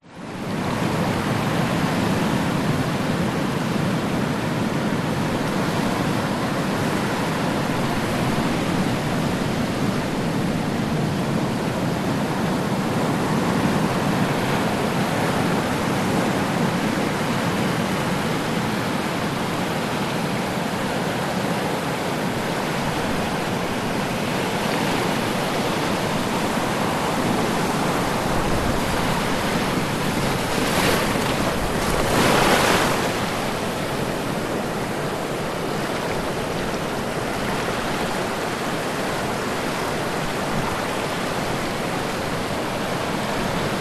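nature ocean waves surf few occasional waves shore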